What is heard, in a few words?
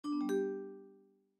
notification sound